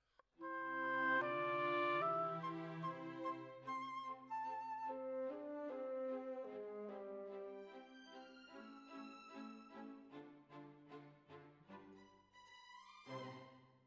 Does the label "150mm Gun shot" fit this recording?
No